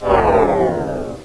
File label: zoomout